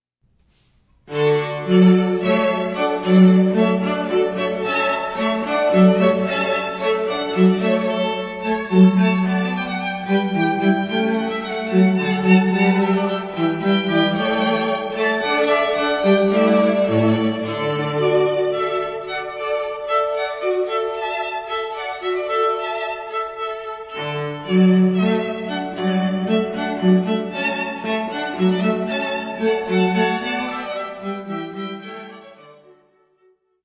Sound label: water music